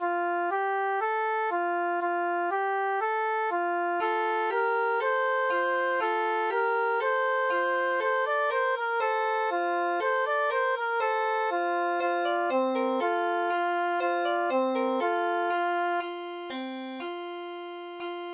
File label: canon